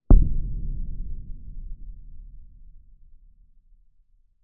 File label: explosion slight distant explosion